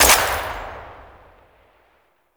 Water Sprayer Tail; Warframe; Weapons

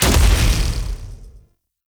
Blitz Activate; Warframe; Eximus

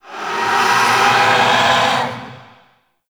Rifle Void Mode Switch; Weapons; Warframe; Zariman

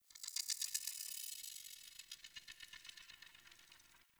Tiny Critter; Warframe; Tau

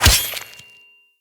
Melee Sword Impact Flesh

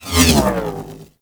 Space Figter Laser Agent Fire Whiz By; Warframe; RailJack; Corpus; Space Fighter Laser Agent

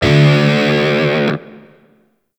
Shawzin Flourish Oct One D; Warframe; Grineer Shawzin

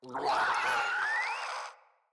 Infested Crawler Death; Crawlers

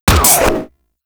Harpoon Gun Charged Shot; Warframe; Sharkwing; Grineer Harpoon Gun

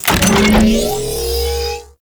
Extending Bridge Activate; Ambience; Warframe; Corpus Gas City; Gameplay Remaster

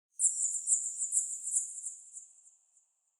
bat chatter distant; Ambience; Under City

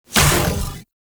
Shot; Warframe; The New War; Weapons; Omicrus

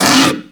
Kubrow Armored Vocal Pain; Warframe

